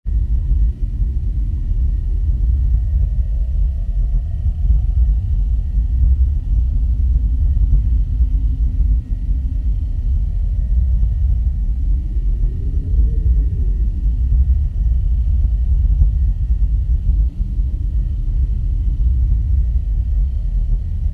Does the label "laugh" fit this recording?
No